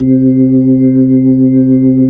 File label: B-3, Jazz